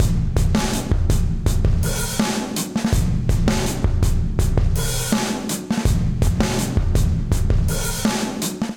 Low And Loud Groove Low Loud Squashed 82bpm, Session Drums; Session Drums Club; Ableton; Factory Packs